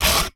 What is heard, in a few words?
Camera Single